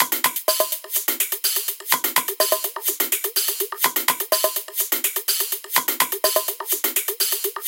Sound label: Percussion - 125Bpm, Techno; Percussion Loops; Get Loose; Ghosthack Advent Calendar - Day 6 - Techno Kit